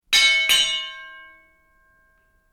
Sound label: boxing bell